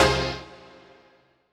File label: HOUSE, House Dance